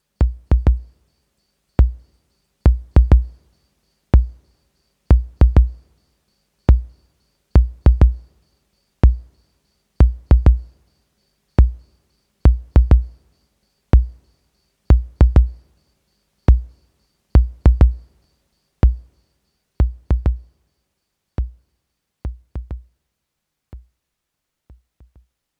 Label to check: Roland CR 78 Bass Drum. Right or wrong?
Right